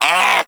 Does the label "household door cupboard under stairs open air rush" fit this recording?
No